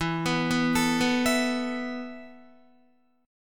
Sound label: E Suspended 4th E, down, Guitar, 4F,4A,5C,5G,5C,6E; Esus4 arpeggiated